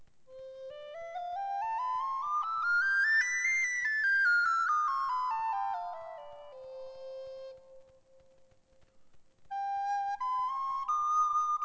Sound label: sop, flute baroque